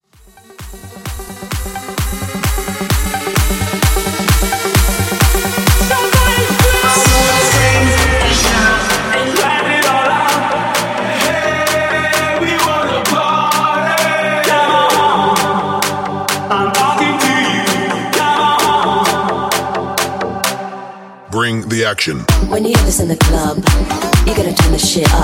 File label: Rework 130 Bpm; Bootleg